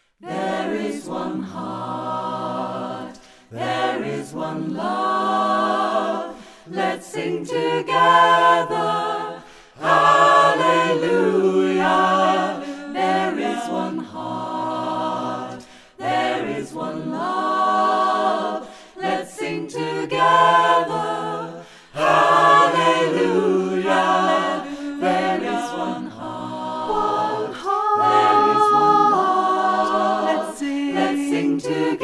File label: Ensemble